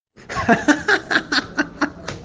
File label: Ajajajaj